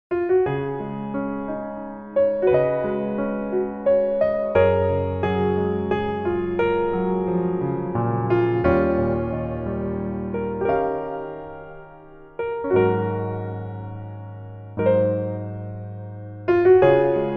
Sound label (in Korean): Piano ver, 멜로디MR, 남자키